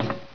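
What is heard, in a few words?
metal, footsteps; hl2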